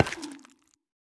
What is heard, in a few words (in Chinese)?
幽匿催发体踩踏声